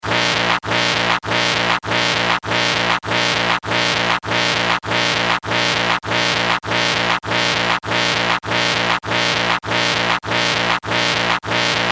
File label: Electric Laser Pulsar Loop